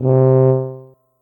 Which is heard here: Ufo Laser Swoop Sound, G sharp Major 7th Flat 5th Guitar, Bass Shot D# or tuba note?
tuba note